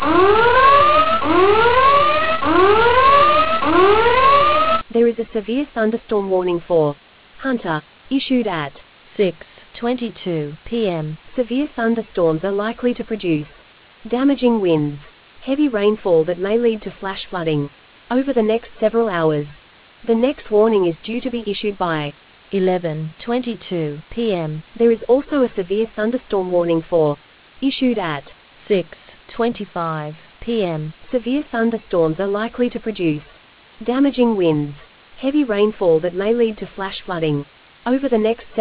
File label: storm audio